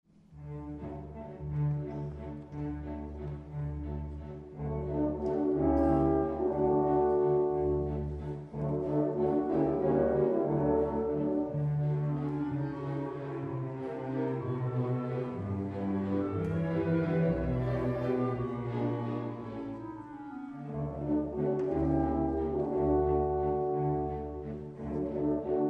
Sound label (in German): Blumenwalzer, orchester